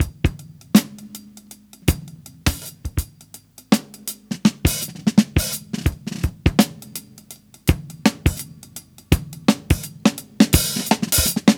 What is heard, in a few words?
Breakbeats